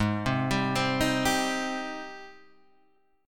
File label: Ab Major 7th Ab down Guitar DADGAD